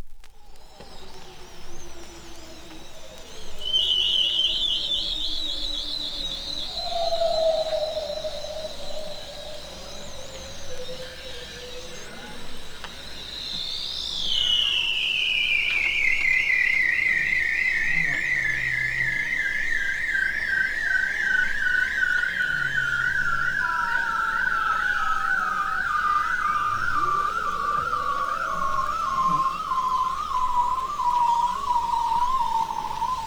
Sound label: Whale Bearded Seal